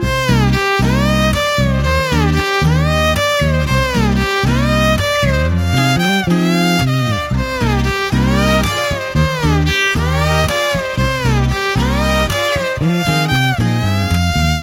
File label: Violin Tone; Telugu Ringtones; 15 Sec; from Rarandoi Veduka Chuddam Movie